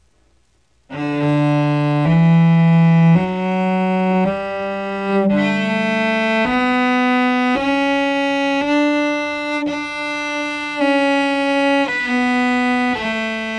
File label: D Scale